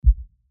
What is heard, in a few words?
hover off